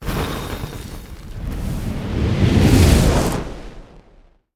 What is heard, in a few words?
destruction fire charge; skyrim